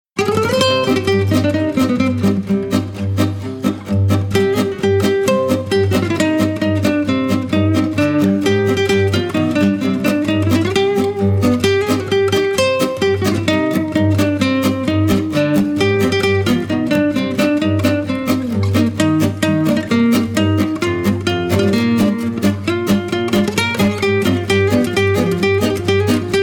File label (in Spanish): guitarra espanola; Alasbarricadas